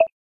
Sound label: scroll tone; braillesense